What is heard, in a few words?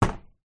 footstep single metal; foley; zapsplat